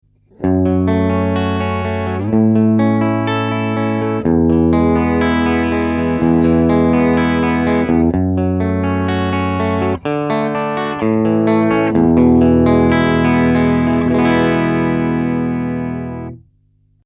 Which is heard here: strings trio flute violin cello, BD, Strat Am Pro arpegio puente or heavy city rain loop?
Strat Am Pro arpegio puente